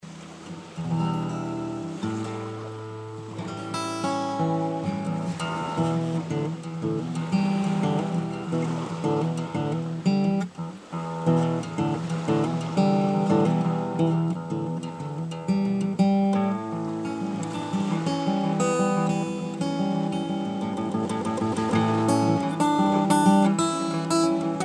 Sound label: guitar; crimea